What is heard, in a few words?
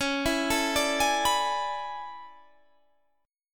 A Major 11th, guitar